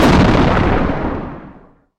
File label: thunder crack; Nature; weather